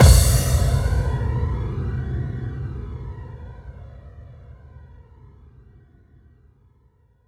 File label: Hallkick; FX; Vengeance Samples